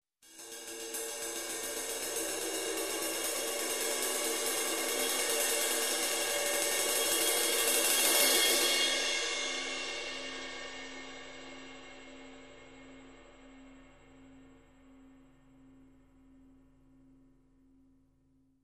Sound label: Ride Swell